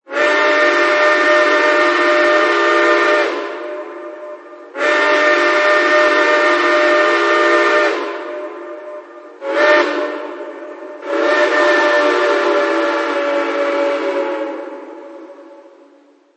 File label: Whistle; MTH; Docsider